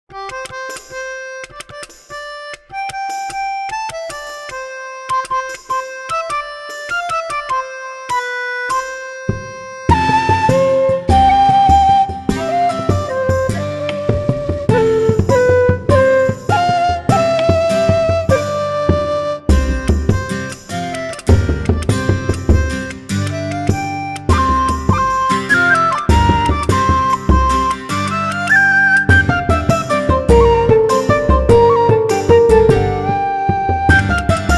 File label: a cozy tavern